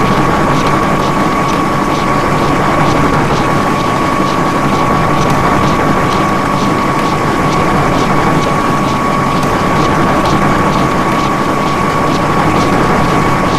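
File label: Grass Grinder Distant; Titanfall 2; Boomtown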